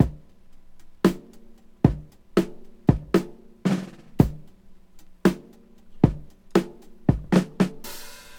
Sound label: 57 bpm drum groove c sharp key, kick tuned to the C# note